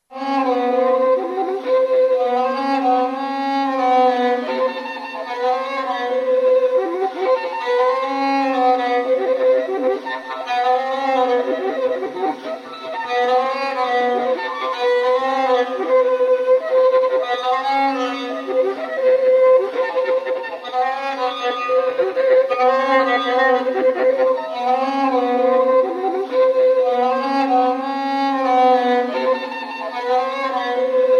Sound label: siren